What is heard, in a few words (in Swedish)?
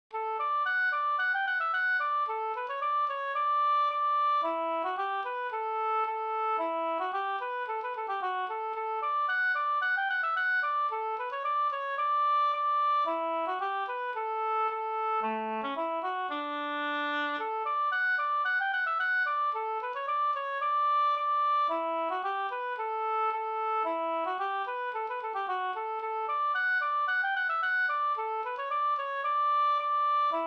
polkett; Steppen Vb